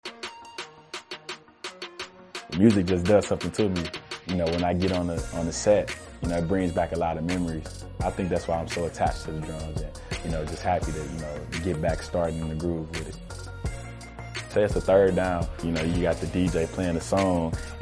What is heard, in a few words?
Drums Promo; Kirksey